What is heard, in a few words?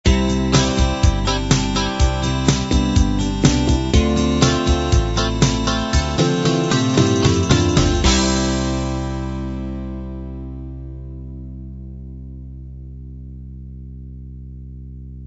light rock end